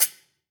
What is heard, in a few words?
knife